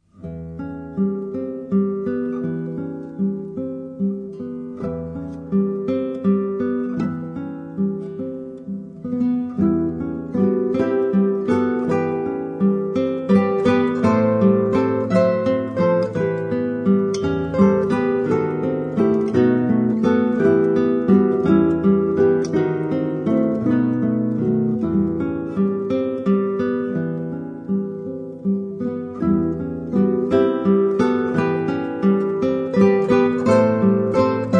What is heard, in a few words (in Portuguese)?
cancao, guitarra classica